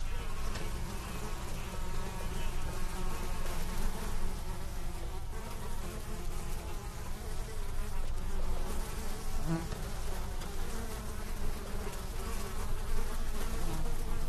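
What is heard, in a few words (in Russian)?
на чердаке жужжащие мухи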